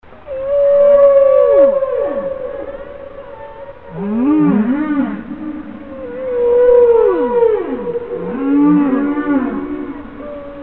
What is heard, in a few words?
Sound effect whales